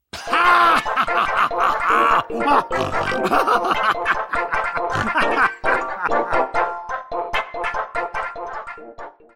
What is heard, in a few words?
win; freak fortress 2; sans